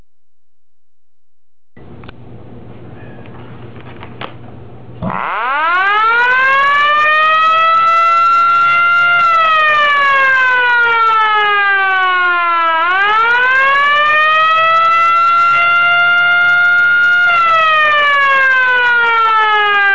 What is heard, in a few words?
Navy siren; Surface ship - H2S4 mechanical siren. This siren is typically used in a number of applications, most notably After Ste